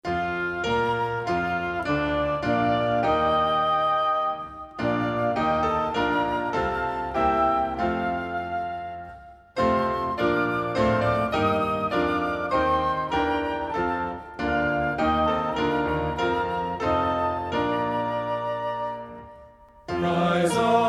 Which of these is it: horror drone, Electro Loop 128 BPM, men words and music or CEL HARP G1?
men words and music